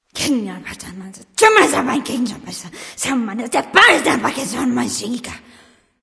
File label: grumble; Smite; athena